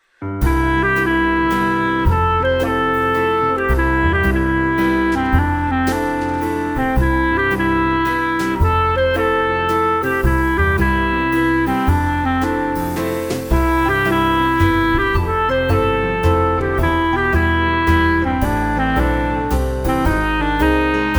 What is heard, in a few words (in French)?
valse drink, romantique